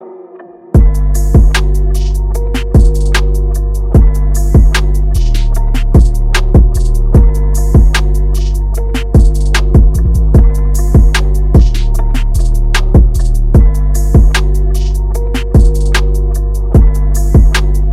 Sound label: Chill trap